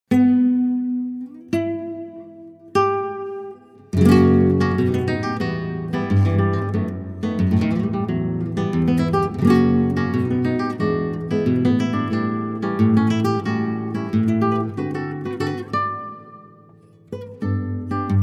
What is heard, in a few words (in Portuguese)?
Choro; MusicaDoBrasil